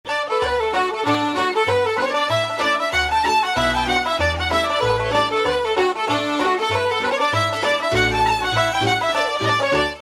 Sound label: hornpipe; IV figura; CASHEL SET